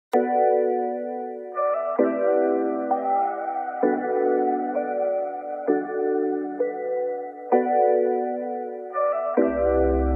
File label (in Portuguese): funk automotivo